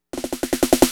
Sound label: Fill, Snare Fills